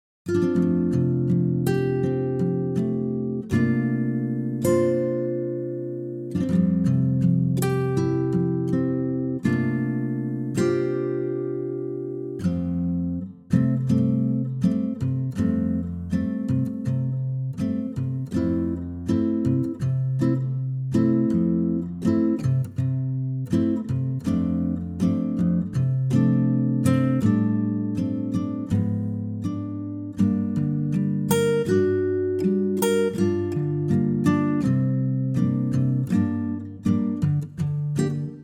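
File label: F acoustic guitar; key - F - vocal range - D to C; Stunning solo acoustic guitar arrangement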